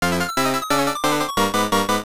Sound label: Lose (Hit Lizard)